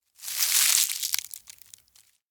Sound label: dry flower touch; anemoi